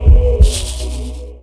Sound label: pick life